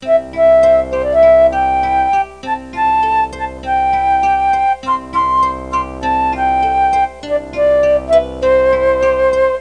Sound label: HAPPY SAD; Education Publishing Concepts; NOAHDEMO